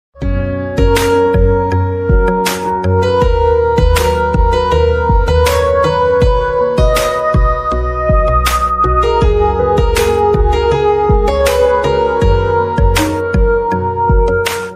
flute ringtone most romantic ringtone instrumental ringtone